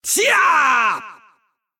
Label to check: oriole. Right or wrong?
Wrong